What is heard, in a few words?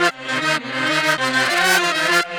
Back Effect Sect, Effected Horn Section, Jazz; Sonic Foundry (Sony Creative Software); Jazz Solos and Sections